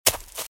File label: Footsteps - Leaves - Run - Left; RadwinIsland